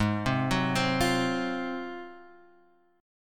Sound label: Ab 13th Ab down, guitar, Ab13 chord; arpeggiated; JGuitar